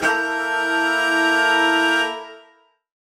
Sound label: Horn Swell Alt Eminb5; undercover samples